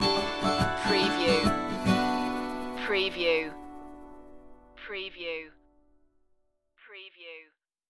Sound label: perfect day stinger